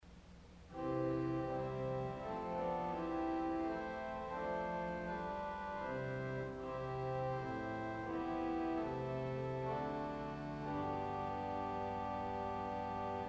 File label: Salicional St Anne, organ stop; CulverAcademy